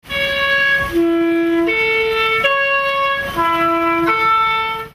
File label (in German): Schweizer Posthorn